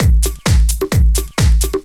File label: drum 130 tribal, drumloops; Techno Trance Essentials